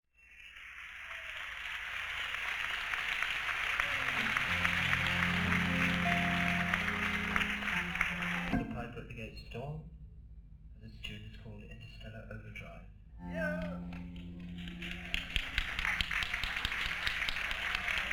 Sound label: Tuning